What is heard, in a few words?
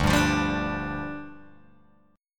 D Minor Double Flat 5th, down, Guitar, Open G